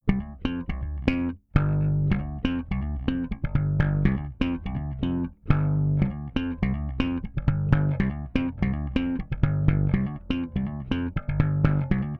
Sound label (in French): grave 6 mediums -6 aigu 8 compresseur rapide slap, bass; smpro